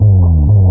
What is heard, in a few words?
Slides; Vengeance Samples; VEH1 FX